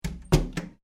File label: Door Wooden Hollow Close; Various doors; Gate Sound Effects; Gates (Sound Effects:Doors)